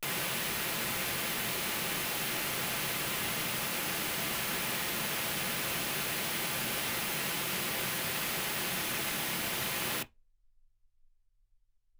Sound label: pink noise 48kHz